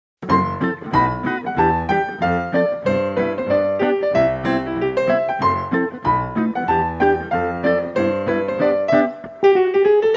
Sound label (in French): marche nuptiale